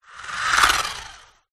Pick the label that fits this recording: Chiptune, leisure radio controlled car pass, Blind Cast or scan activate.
leisure radio controlled car pass